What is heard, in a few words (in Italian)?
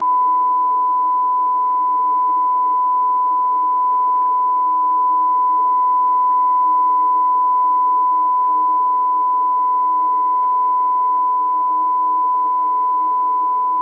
tonopuro 1kHz; Mic2 driver; caldaia spenta; CESI; LaSpezia